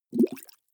bubbles soft

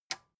metal button tiny release disable

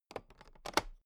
toolbox latch open loose clatter

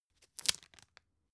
tissue open

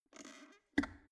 plastic water boiler close lid

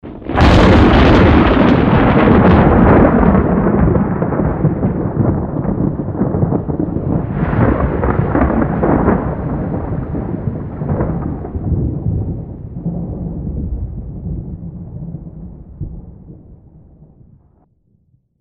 thunderclap heavy

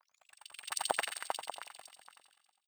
sci-fi subterranean light up high

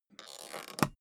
suitcase close latch lock

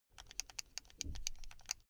buckle rattle quick

